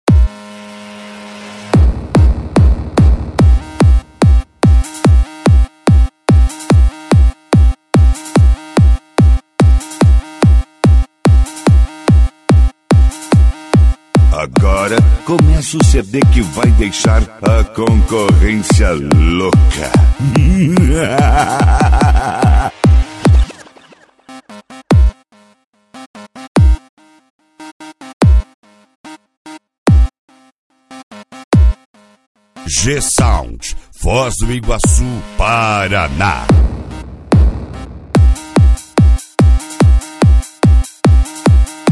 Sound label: especial funk bass